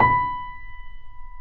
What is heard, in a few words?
Mp B 2 off, Grand Piano off mic; AKAI S6000 CD-ROM - Volume 2